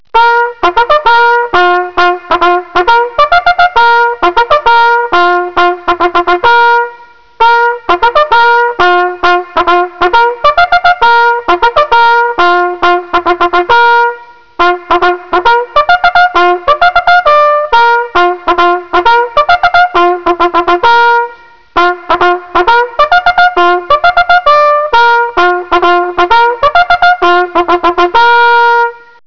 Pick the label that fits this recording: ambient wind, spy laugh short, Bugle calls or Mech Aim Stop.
Bugle calls